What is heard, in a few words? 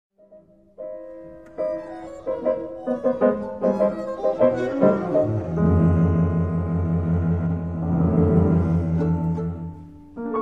for piano, violin & cello Distant; Mamlok; Panta Rhei (Time in Flux)